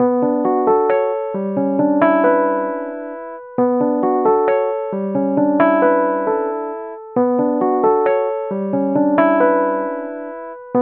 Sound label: Ringtone Logo Piano dry easy loop Music Loop